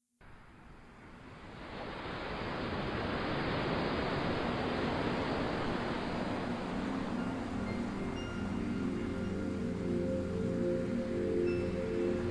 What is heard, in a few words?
Delta Waves Zen Sleep Music